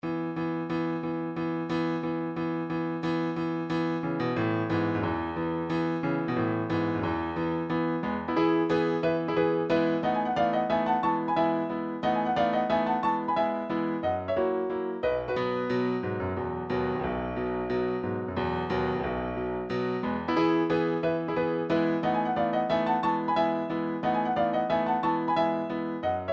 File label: mazurka, midi; skriabin